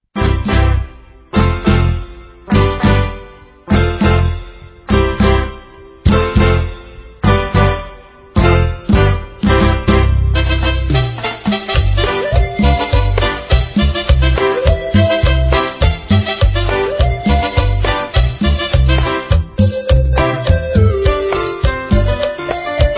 Punta Cumbia

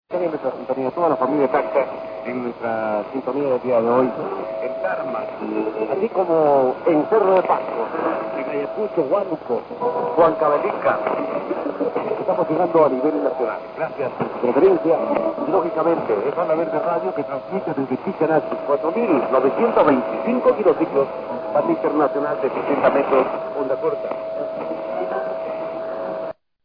Radio; Radio Onda Verde; Pichinaki; Perú on SW

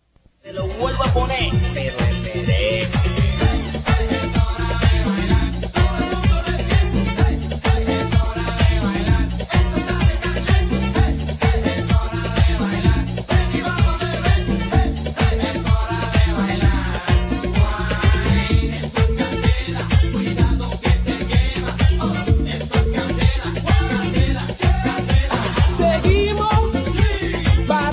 hip hop

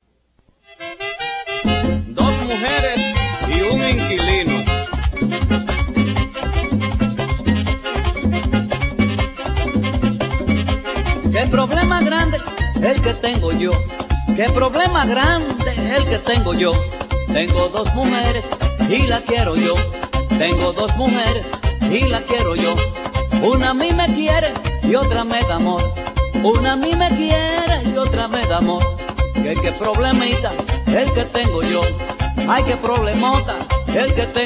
merengue

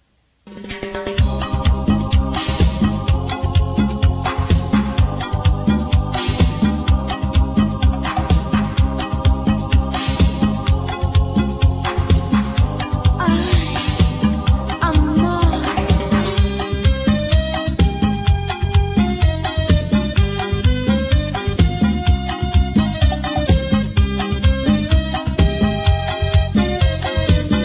tropical technopop

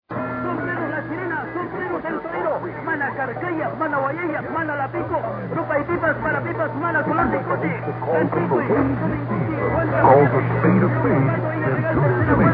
Radio; Radio La Voz de Huamanga; Ayacucho; Perú on SW

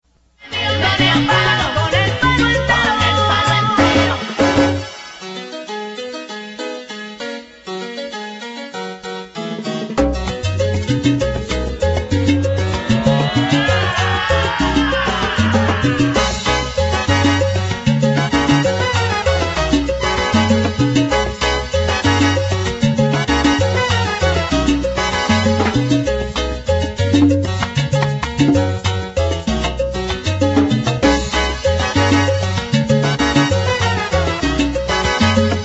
salsa

